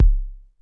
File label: kicks, Bass Drum